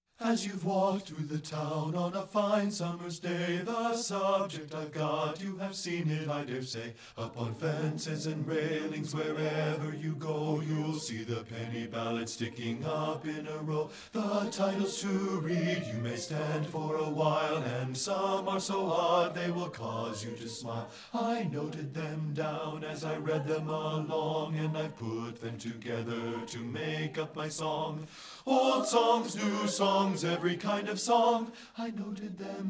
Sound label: BASS; STEPHEN FOSTER MADRIGALS